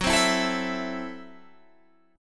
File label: F sharp Minor 7th Flat 5th F sharp Guitar Open G